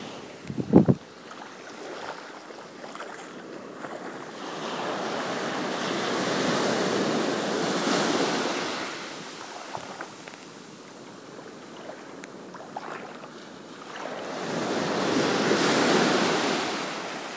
beach sounds

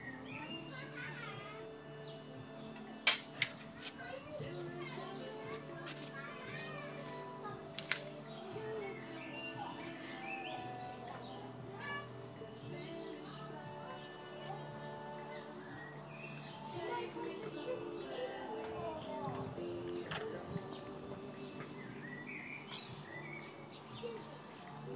BBQ background

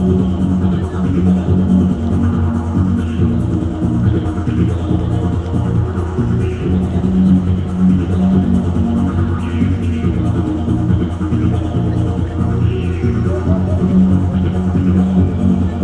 didgeri

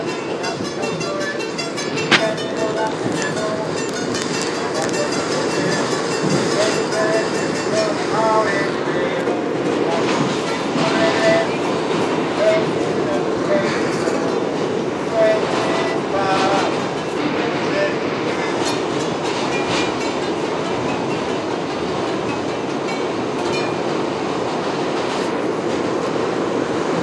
subway song

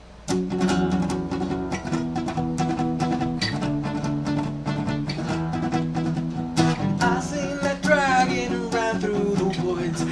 acoustic power metal

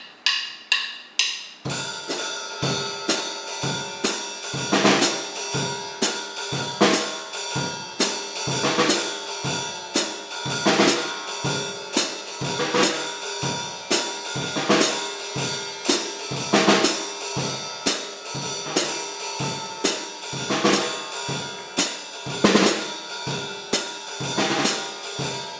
chaotic jazz

